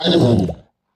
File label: Fishmen Injure; We Are the Dwarves